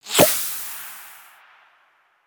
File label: Pets Skunk Stink Spray; creatures pets; LEGO